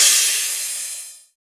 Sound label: Crash Cymbal, House; House Kit; Drum One-Shots; GhostHack; Ghosthack Advent Calendar - Day 3 - House Kit